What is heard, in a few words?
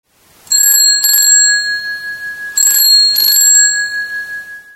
doorbell; doorbells